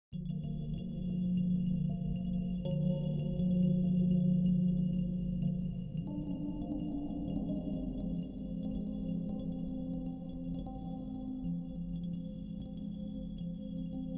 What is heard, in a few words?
Alien Bell FX; Sublte Alien